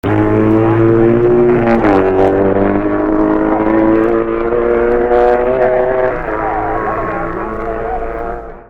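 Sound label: BMW, motorcycle; 1958; Dky Dale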